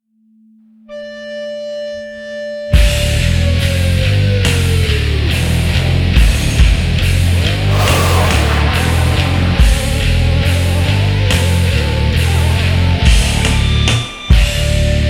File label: rock